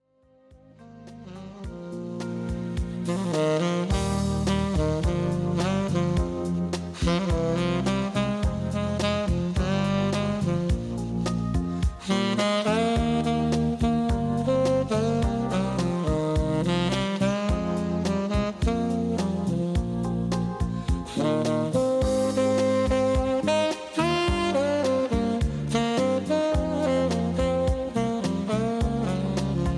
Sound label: Sax